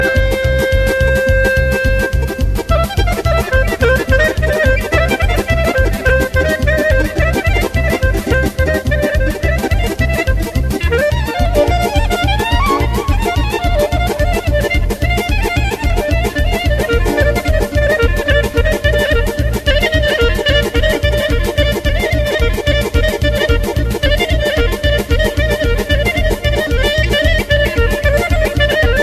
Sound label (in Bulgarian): Selsko Horo